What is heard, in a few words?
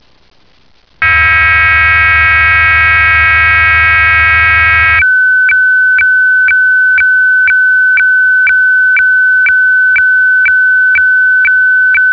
fax; HF-FAX